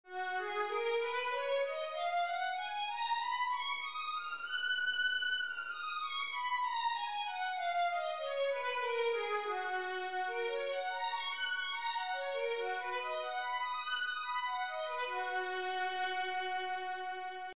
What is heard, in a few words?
violin g flat major, scales 2 octave